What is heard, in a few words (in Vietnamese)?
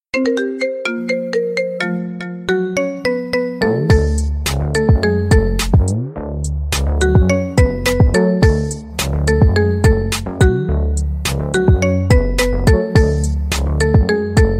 Nhac Chuong Iphone 14 Pro Max Remix